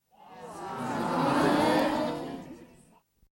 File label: audience